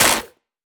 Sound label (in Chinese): 红树根破坏声